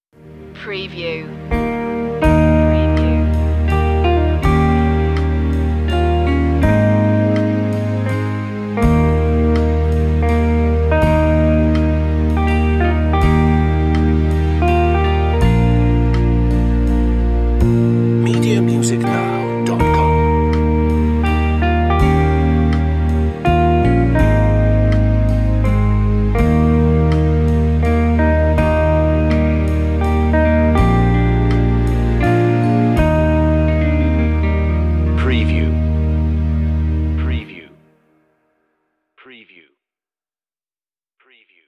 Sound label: amazing grace brisk verse 82bpm